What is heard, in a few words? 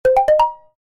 Xylophone